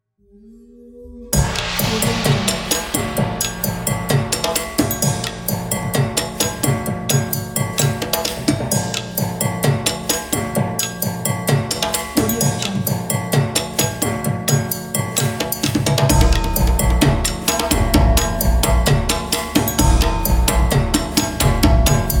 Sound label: dragon drums